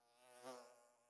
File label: insect; netent